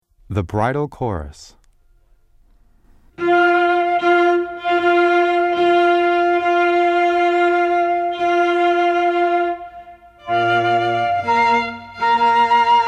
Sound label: bridal chorus